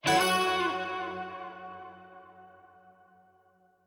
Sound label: win small; netent